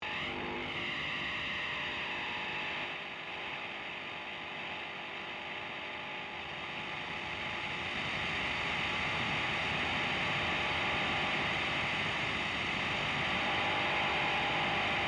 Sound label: Slaughterhouse noise